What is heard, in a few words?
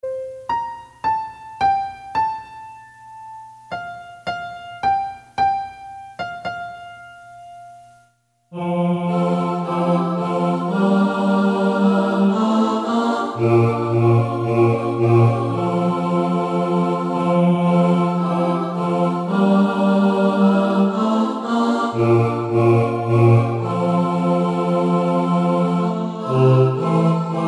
BASS